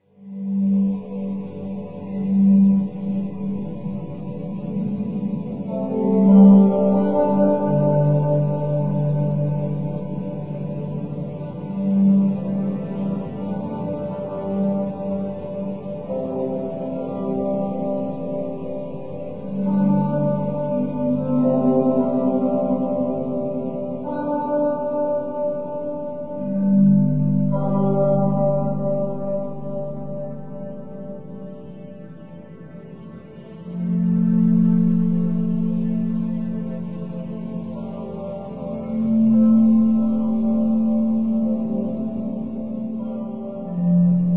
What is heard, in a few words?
drone